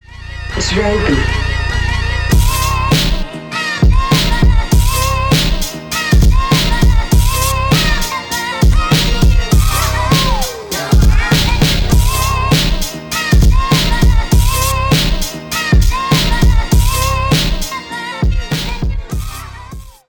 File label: 100bpm, musique